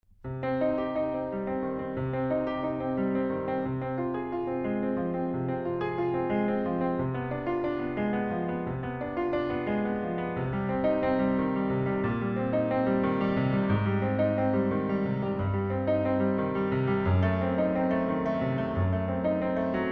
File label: Bach Prelude C minor, Classical